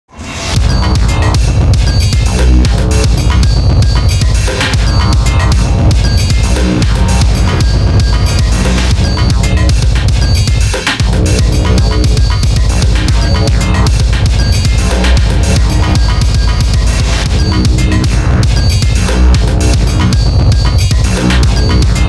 Action Short; Hunt Down the Freeman

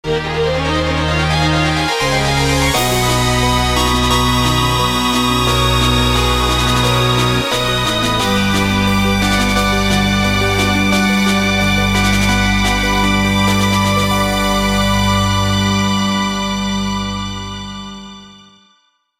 Grand Opening; paper mario the thousand year door; GameCube

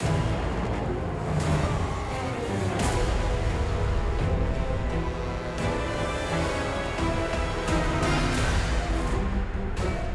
Battle Boss Snow

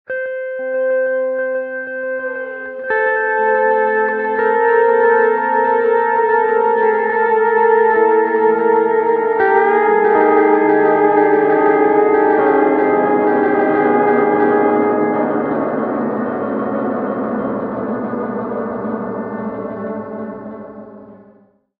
G steel

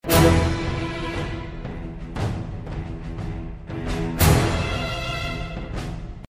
Action Jingle; Red Ocean

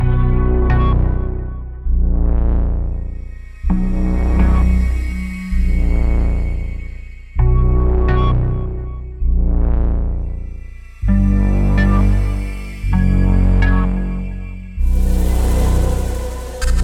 No Drums Stereo

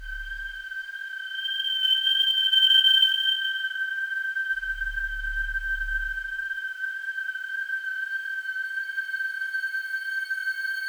Loop fade; Heroes Over Europe

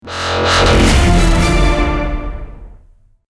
Hybrid Orchestra; Toxikk

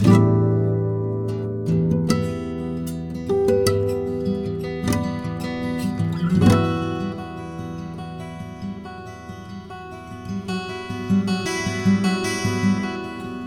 Camp Guitar C

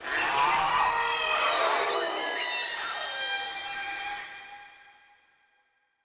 Roar Monster

